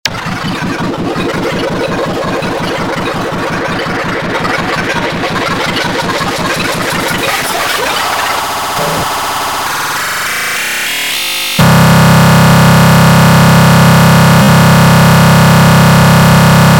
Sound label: TortureTone, EXTRATONE; lolicore; Extratone Rec.